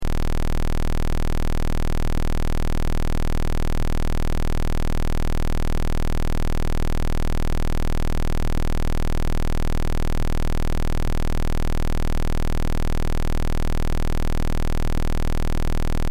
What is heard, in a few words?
Test Tone Square; Wave 20Hz 60 Secs -18Db Loop; Blastwave